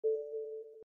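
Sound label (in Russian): звук SMS; skipe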